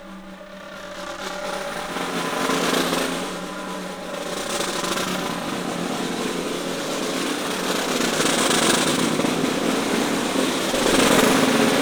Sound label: PICSNR, 3D Drums, PICOLO ROLL; 3D Sound